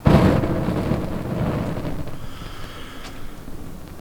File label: Fire Staff, Fire sound effects